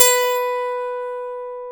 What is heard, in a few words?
EL A GT2 B3; AKAI S-Series CD-ROM Sound Library VOL-7; A GUITAR 3